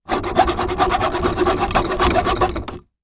Industry Tool Wood Sawing Wooden Door With Door Knob Rattle Fast